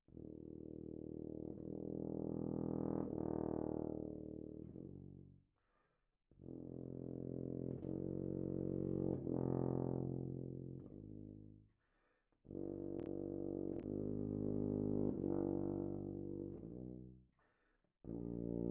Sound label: tuba; fafner